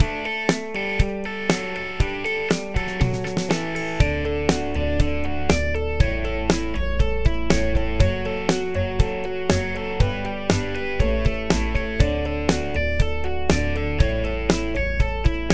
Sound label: Little Groove